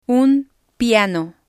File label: un Piano